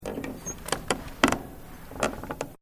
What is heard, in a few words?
Wood Creaking; Material components for constructing more complex sounds; Materials